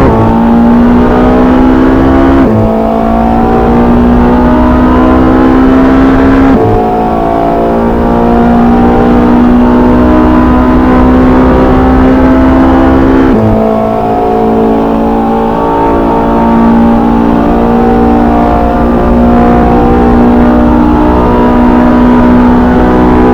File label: fourth, vehicles, ferrari 488 gtb; autos